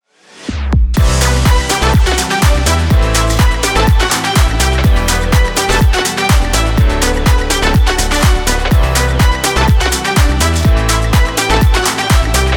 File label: event party